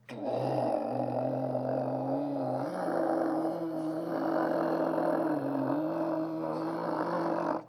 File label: Sing; Skarrgard